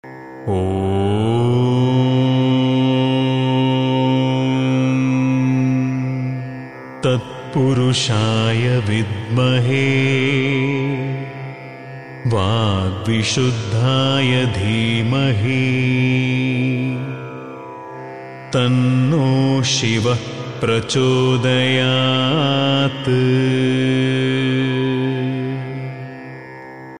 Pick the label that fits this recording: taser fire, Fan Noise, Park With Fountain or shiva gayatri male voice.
shiva gayatri male voice